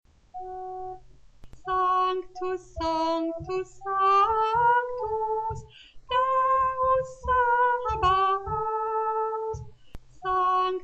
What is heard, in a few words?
Sanctus Soprano